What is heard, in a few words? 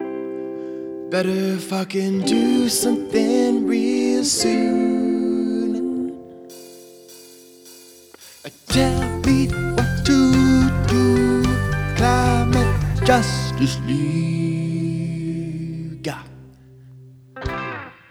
theme song